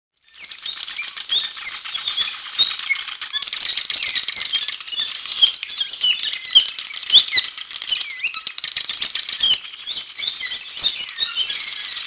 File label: cave swallow